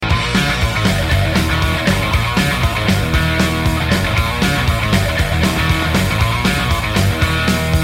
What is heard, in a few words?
Guitar Loop